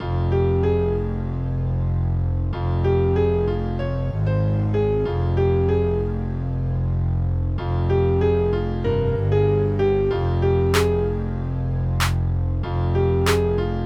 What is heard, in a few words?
hip hop